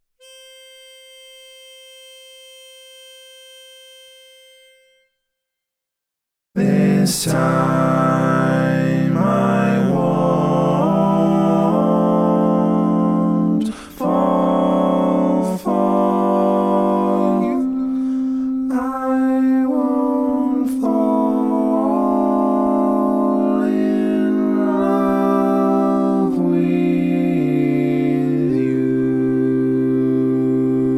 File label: Bari, barbershop tags